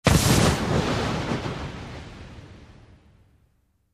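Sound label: Explosion Water Splash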